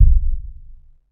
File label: Thud High short reverb